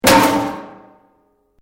METAL BOX; Metal (Sound Effects:Materials)

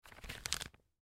Zip Lock Bag Pick Up; Household; Plastic; Sound Effects:Materials

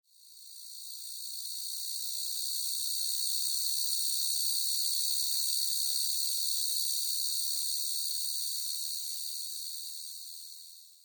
Jungle Plains Ambience; Scary Soundscape Sound Effects; fantasy sound effect

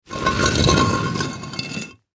Brick Drag Movement; Brick Drag Dirt Rock Scrape; Dragging; Foley; Objects; Blastwave

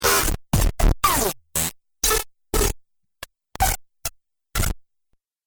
Droid Needs Repair, robot sound effects; Andrew Fleming; Twistedtracks

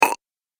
Cartoon Game Voice Burp Sound; Cartoon Voice Sound Effects

